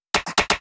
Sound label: Fill 128 BPM; Vengeance Samples; VEH1 Loops